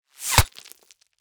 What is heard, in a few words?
Impact Whoosh House Brick; Invector 3rd Person Controller; Shooter; Weapons